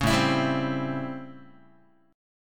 A 7th Sharp 9th, Guitar; A7#9; strummed; JGuitar

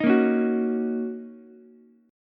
D sharp Minor 7th, Ukulele; strummed; JGuitar

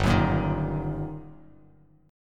G Minor Major 7th Sharp 5th, down, Bass Standard; GmM7#5 strummed; JGuitar

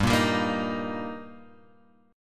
G Minor Sharp 5th G, Guitar, Open G; chordshape; down

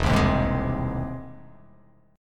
E Augmented Major 7th E, E+M7 chord; strummed; JGuitar

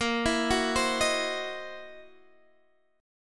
C 7th Sharp 9th Flat 5th

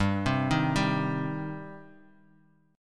G Suspended 4th Sharp 5th G down; Gsus4#5 arpeggiated; JGuitar

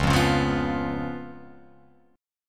Db 7th Db down Guitar Open F; Db7 strummed; JGuitar

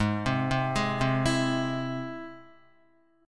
G sharp Augmented, Guitar; arpeggiated; 3,3,0,1,0,0; 4F,4A,5C,5G,5C,6E; JGuitar

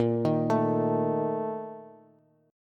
A sharp Major 7th Suspended 4th, Bass; arpeggiated; JGuitar

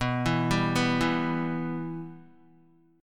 B Suspended 4th Sharp 5th, Guitar; arpeggiated; 4F,4A,5C,5G,5C,6E; Bsus4#5; 6,7,7,4,4,x; JGuitar

